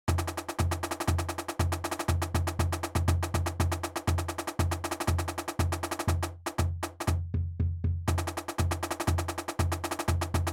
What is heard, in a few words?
marching english style